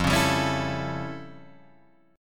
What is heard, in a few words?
F Augmented Major 9th, Guitar, Open D